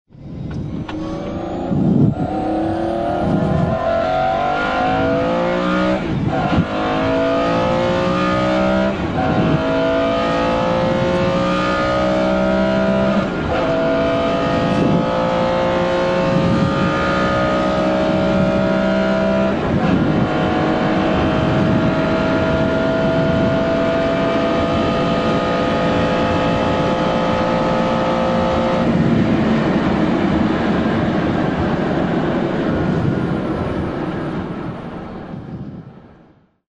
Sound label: mclaren